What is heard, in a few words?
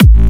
Kick Bass Dancehall A